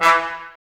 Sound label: brass, Tbs Mute